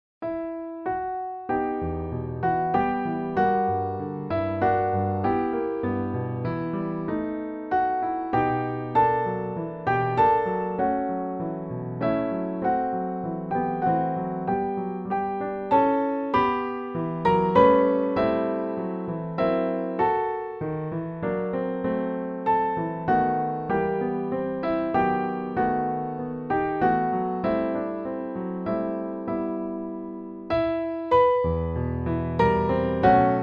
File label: siciliano, piano duet